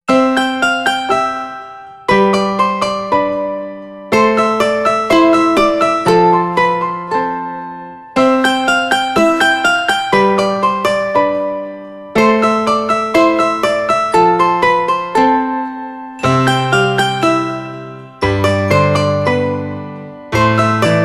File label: Ring-Gold Ring classical; Windows Mobile ringtones; Microsoft